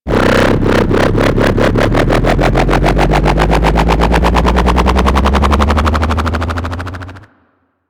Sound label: Drop Ship Tractor Beam; Warframe; Corpus